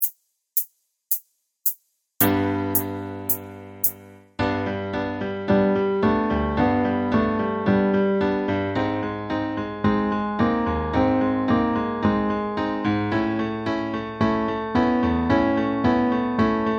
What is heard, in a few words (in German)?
Übe Playback 110bpm